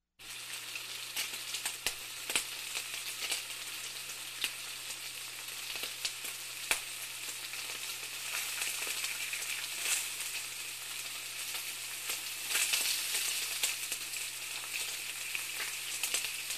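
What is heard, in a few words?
zharka kartoshki, frying potatoes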